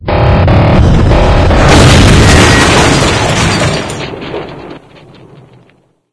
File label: intro sfx; sven; cryo u 2